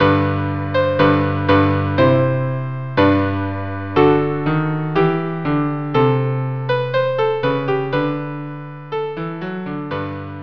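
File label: violin cello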